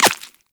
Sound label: Bullet Impact Flesh; Warframe